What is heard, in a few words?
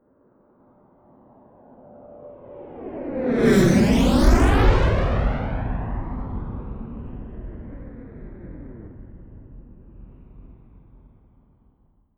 military jet fa-18 hornet turbine flyby fast close